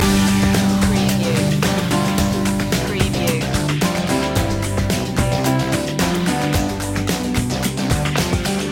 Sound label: shopping centre loop